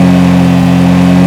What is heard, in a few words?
simulated vehicles low